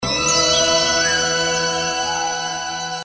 intro sfx; Africa Run

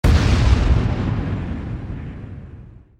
explotion; games; Combat Romance; AUDIO for Attractions

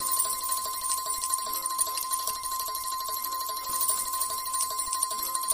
increment; Fishing For Gold

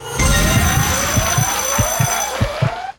mega win; Fire Queen

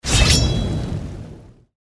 shield drop; Ares God Of War

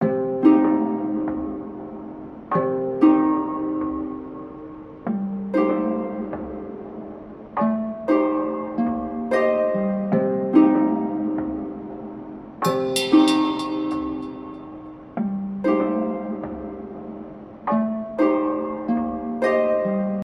melody; Book Of Queen

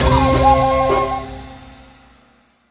freespin congratulation; Wild Ape

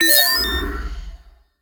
gambler win; Magic Stars 5; Wazdan

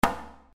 reel spin click; Primeval Rainforest

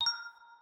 gambling mouseclick; Magic Hot; Wazdan

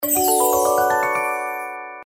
big win; Four Beauties

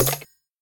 spin button; buttons; Xing Yun Jin Chan

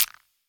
gambler mouseclick; Fruit Fiesta; Wazdan

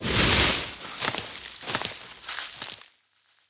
gambling start; In The Forest; Wazdan

